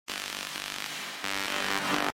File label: Techno